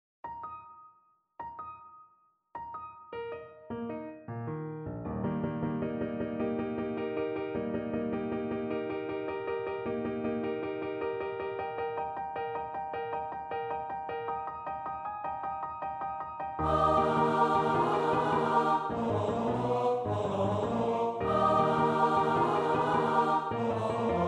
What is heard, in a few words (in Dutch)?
S-A-T-B, koorpartij oefening; Wagenaar (Johan); De Schipbreuk